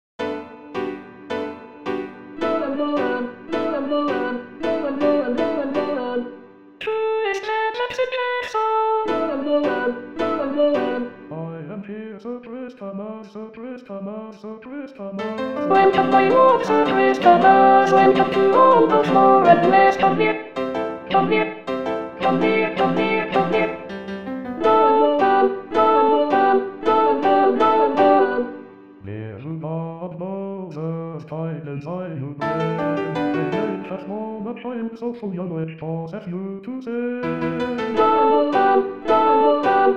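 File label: Alto, rehearsal tracks christmas; Sir Christemas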